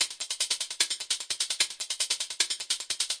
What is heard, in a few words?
150 loud phasing 16th hats; 141 to 160 bpm